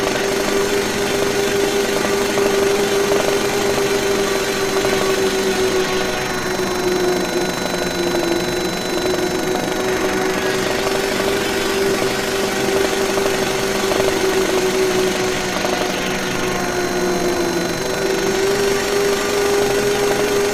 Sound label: Worker Drone Hover; Titanfall 2